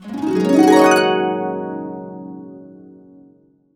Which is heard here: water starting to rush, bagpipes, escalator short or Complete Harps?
Complete Harps